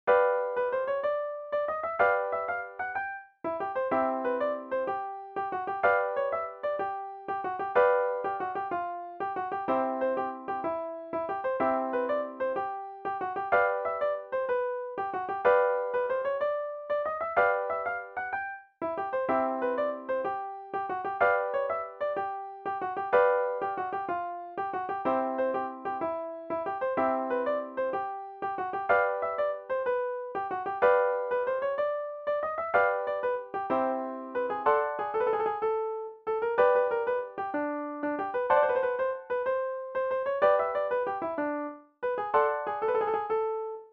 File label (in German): Marsch; Diessenhofen; Siegelturm-Marsch